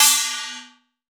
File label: Kwaengwari f; Cymbals